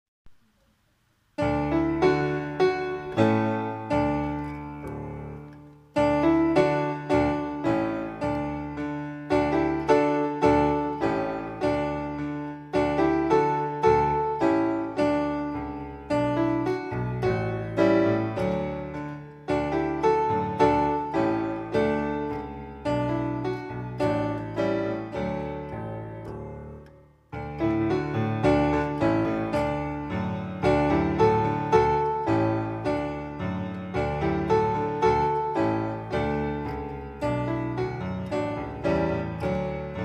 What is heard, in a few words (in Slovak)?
klavír